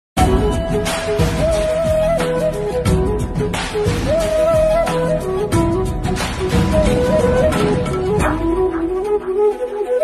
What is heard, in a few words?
krishna flute music krishna flute ringtone instrumental music